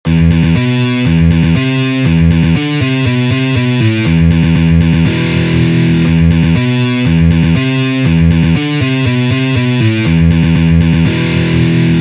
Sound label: Electric guitar riffs